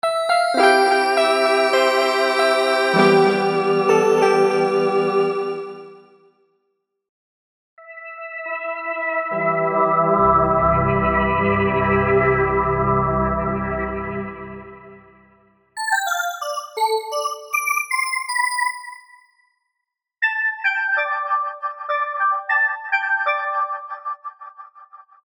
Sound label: piano organ